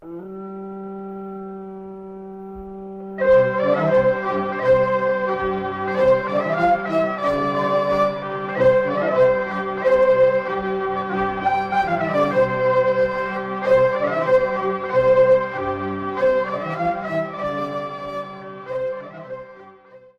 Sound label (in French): Violoneux, Belgique